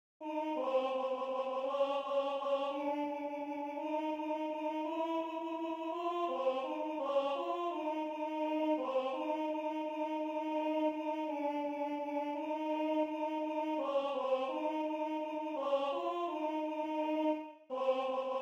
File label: Alto